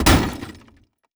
Hit In, Damage; DCS

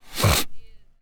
idle nose

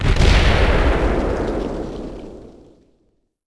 Bomb Two Explosion; Civ4 warlords

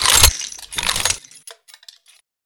Inf Weapons; Red Orchestra 41-45

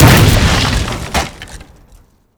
explosion door wood breach; Explosions; Call of Duty Modern Warfare

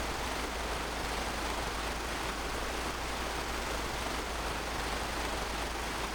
Aircrafts Engines DB605 Prop; DCS

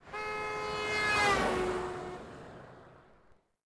Horn Doppler; Ambient; Civ4 warlords

